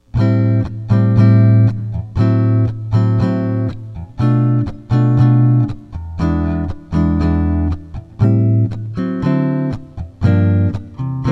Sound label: harder comp; jazz tunes